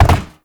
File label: Land Metal, Movement; Warframe; Uriel